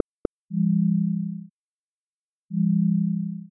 Attentional Haptics, ui

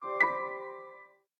Duet, notifications

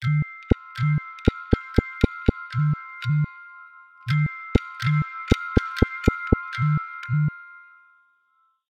Icicles, alarms

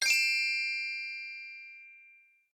Twinkle, notifications

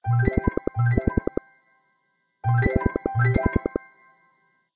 Flutterby, ringtones; lineage-20.0

